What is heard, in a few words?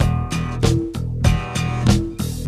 guitar dark, Phonky loops